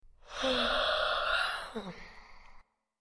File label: Yawn